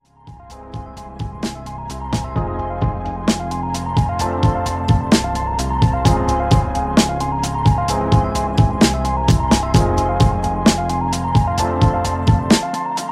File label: victory fanfare